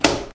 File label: stop; Caribbean Beach Poker; wazdan